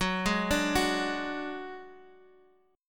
F sharp Suspended 2nd Sharp 5th, Guitar, Half Step Down